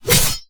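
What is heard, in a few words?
machete, enemy sound impact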